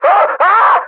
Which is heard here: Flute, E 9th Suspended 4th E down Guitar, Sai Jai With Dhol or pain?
pain